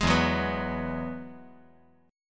E Major Add 9th

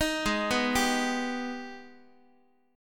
G sharp Minor Major 7th G sharp down Guitar; arpeggiated; JGuitar

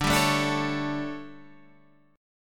D Major 7th Suspended 4th, Guitar, Standard; DM7sus4; strummed; JGuitar

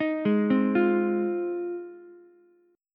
G sharp 6th, Ukulele; arpeggiated; JGuitar

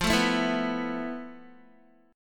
F Major 7th Flat 5th Guitar; strummed; JGuitar

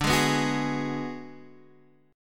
B Minor 6th D

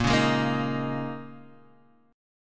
Bb Minor 11th Bb down Guitar Half Step Down

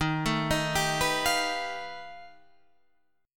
F 9th Flat 5th Eb

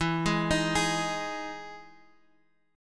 E Major 7th E down Guitar Half Step Down; EM7

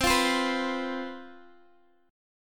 C Major 7th Suspended 4th, down, Guitar, DADGAD

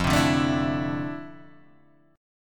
F Major 7th Add 6th, guitar chord; FM7add6 Chord {1 3 0 2 3 0} strummed

